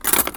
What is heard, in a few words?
Foley